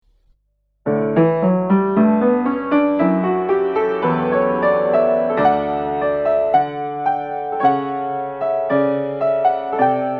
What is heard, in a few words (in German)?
zwischenmusik